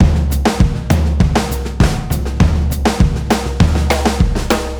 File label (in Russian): ударные и барабаны рок поп